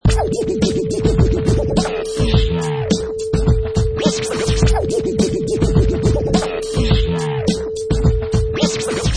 Vinyl Crazy Scratch 105 bpm with beat

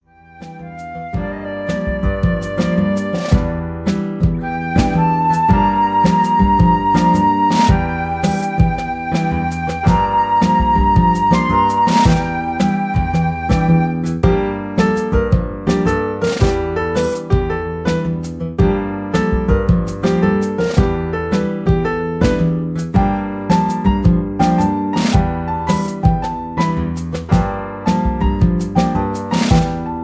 jazzy mood

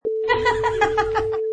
Lady Laugh

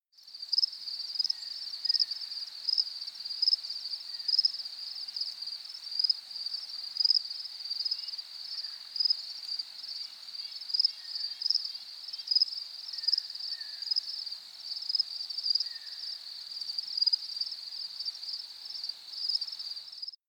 Autumn Cricket

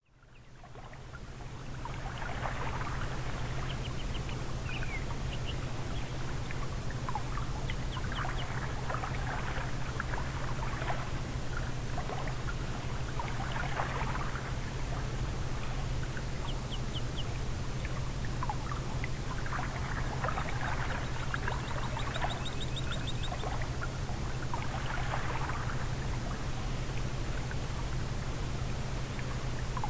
Nature; Fall Asleep Easily

